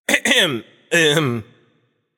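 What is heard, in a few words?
nonverbal clear throat; smite; voicepack; merlin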